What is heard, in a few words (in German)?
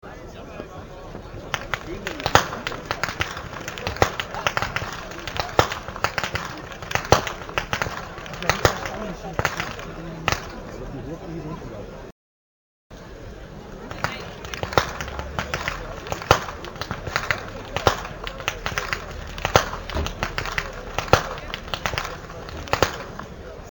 schnalzen; sillersdorf; jugend